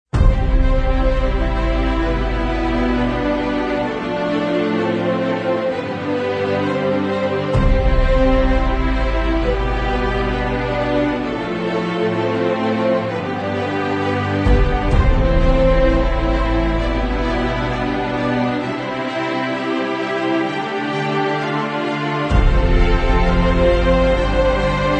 Eterna No Choir

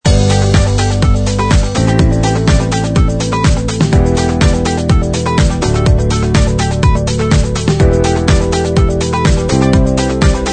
Corporate Upbeat Loop; 音乐素材 企业乐观

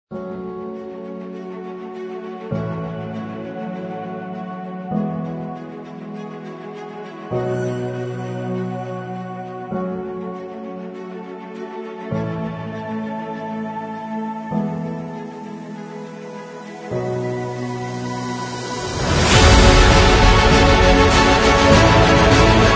Epic

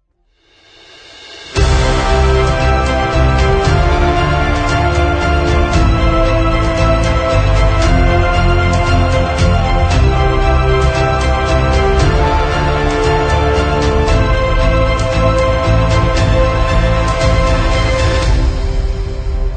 Epic Inspired Corporate; 音乐素材 史诗创意企业

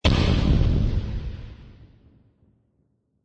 Epic Movie Trailer Slam; True Grit

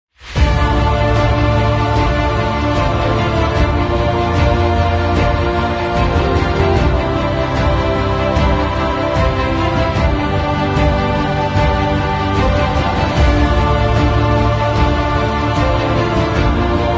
Rising Trailer

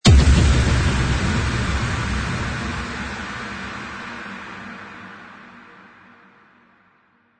Impacts; Zenhiser

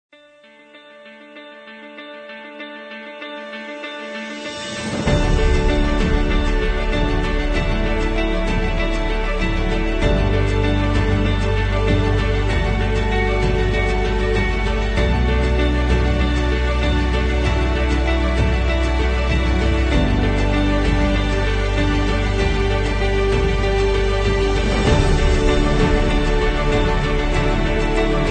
Emotional Motivation Cinematic Trailer Short

